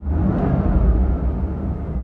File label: rev, cars; CosmicRage